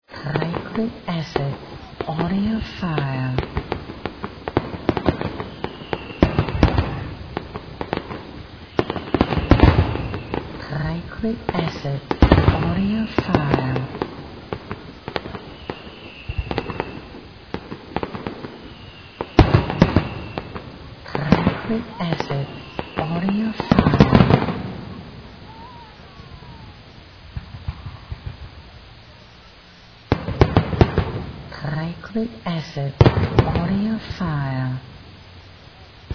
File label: fireworks, Fireworks Field Recording; stereo linear pcm wav 48khz 16bit